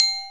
Button, 按钮; 特酷声音素材库

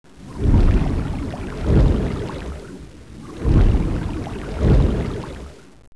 Water, 自然, 水声; 特酷声音素材库

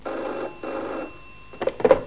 PHONE, 电话; 特酷声音素材库

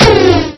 Turbo Over; 暴力赛车

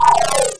Game, 游戏音效; 特酷声音素材库

